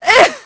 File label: woman hit, duel; Pirates Of The Caribbean